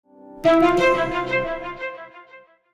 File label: Sonic Logo; NPR